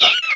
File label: wall pain, headcrab poison; npc